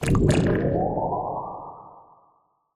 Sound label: space jellyfish